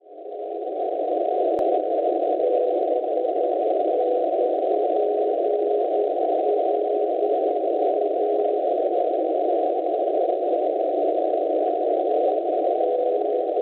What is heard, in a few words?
NDB Pula Croazia 351.5 kHz; PLA; Schwarzsee